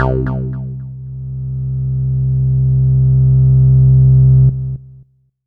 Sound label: C2, SynthLine